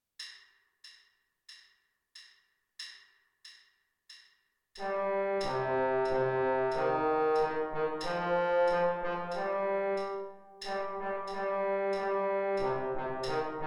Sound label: trombone baritone